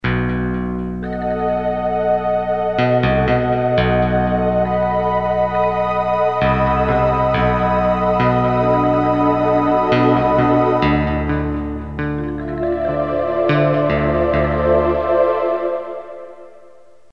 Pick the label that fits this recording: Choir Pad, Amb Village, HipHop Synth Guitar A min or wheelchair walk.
Choir Pad